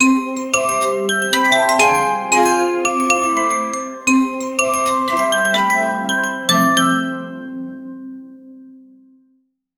warm joyful laughter chee, christmas

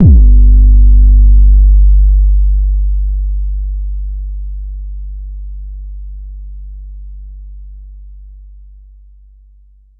single bass 808 drum kick

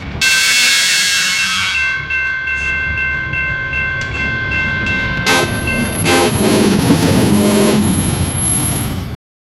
create sound of a train, brake

